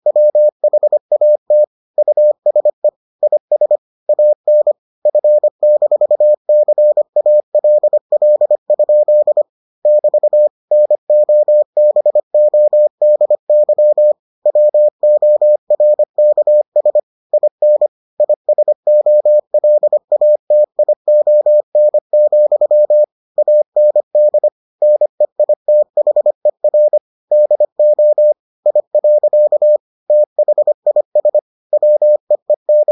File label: morse